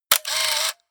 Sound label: camera